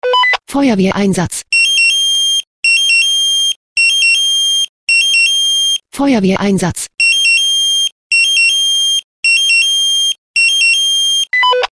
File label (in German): SMS Alarmierungstone Feuerwehr Einsatz